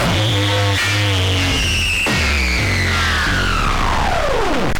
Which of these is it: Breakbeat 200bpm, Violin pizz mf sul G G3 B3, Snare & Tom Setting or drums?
Breakbeat 200bpm